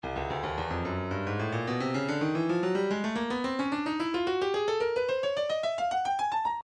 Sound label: breakbeat 073 bpm; blue note f; Stylus Breakbeat Midi Files